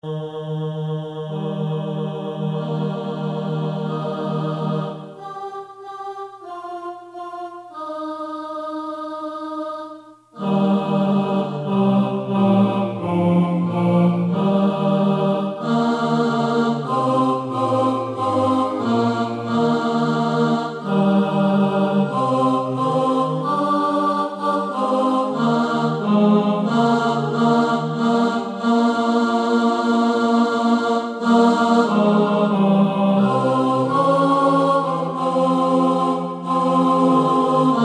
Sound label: TENOR